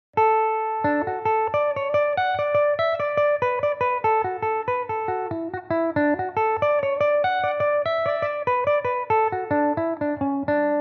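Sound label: manorhamilton jig D major, irish mandolin; Belmuse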